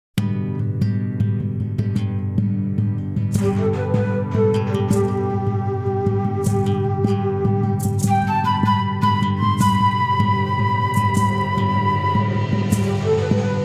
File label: Thunder Magic